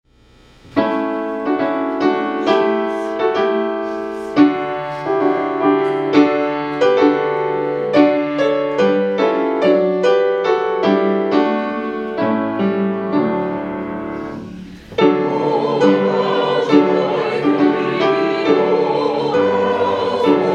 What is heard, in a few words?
hymn; Oh, How Joyfully